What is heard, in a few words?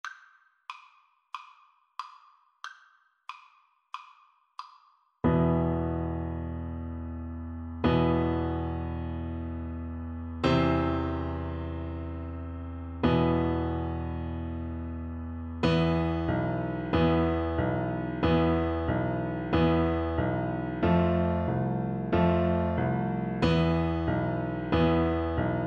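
xylophone, karaoke; Slow Playalong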